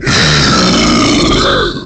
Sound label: Snarl; Godzilla